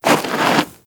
Dirt Turn; moul-assets

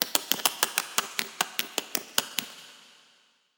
Claws; Bahro; moul-assets

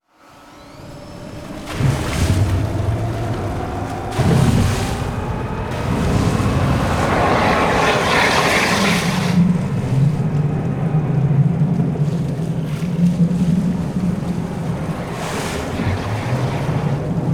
Water Drain; moul

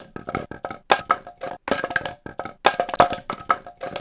Log Stn Roll Lp; moul-assets

